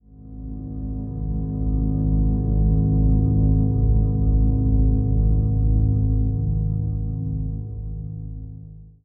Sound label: Transition Stab Dark Medium; Production Element